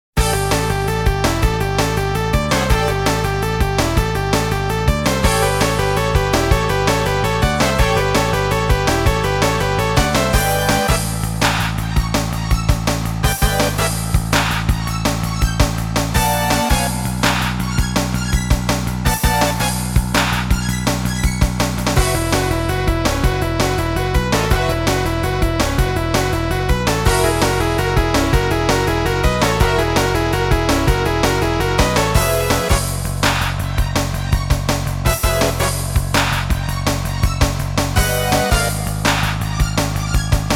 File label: Battle; arc the lad sound track complete